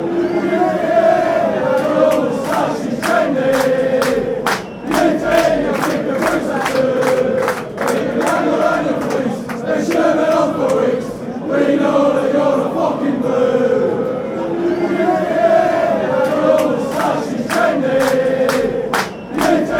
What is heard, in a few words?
you think your moustache is trendy, fanchants